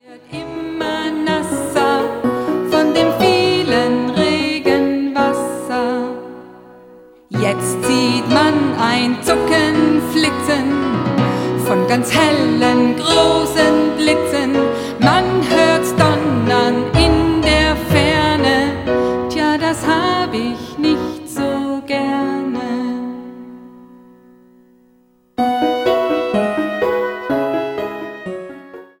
Regenlied; Plitsch-Platsch Wasserspaß

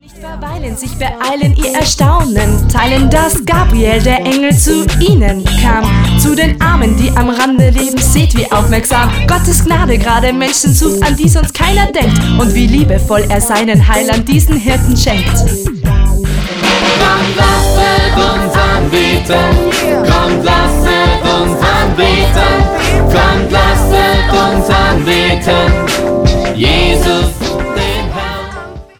Kommt, lasset uns anbeten (Benite Adoremus-Rap)

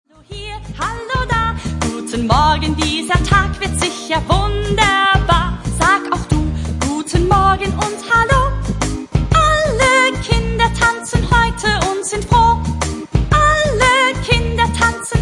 Guten Morgen, guten Morgen (Lied; Pauli geht in den Kindergarten (Hoerbuch)

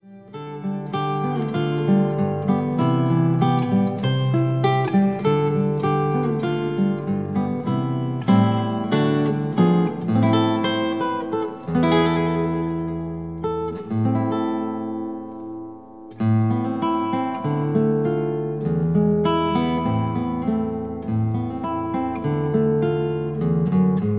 Ich steh an deiner Krippen hier, Gitarrenbuch; Paul Gerhardt Gitarrenbuch mit CD

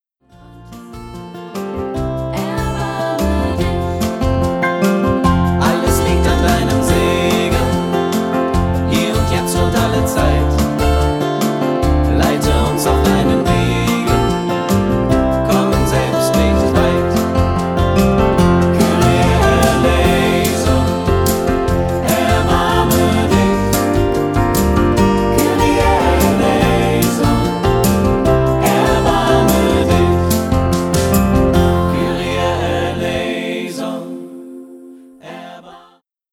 Kyrie eleison (Playback mit Chor); Jahreslosung 2021

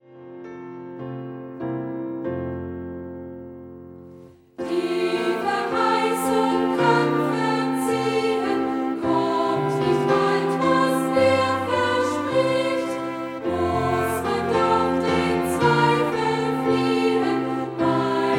Amen, Amen, lauter Amen; aidlinger chor

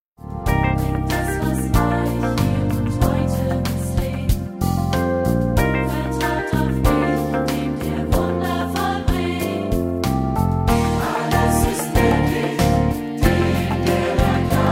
Herr, ich glaube (Playback mit Chor); Jahreslosung 2020

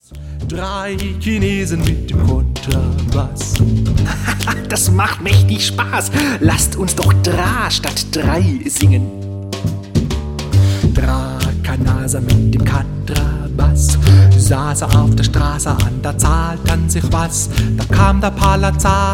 Drei Chinesen mit dem Kontrabass; Musikarche

